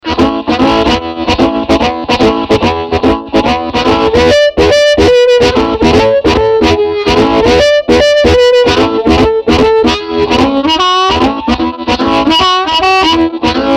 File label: rhythm